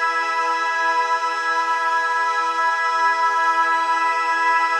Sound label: Pad Loop E, shimmer and sparkle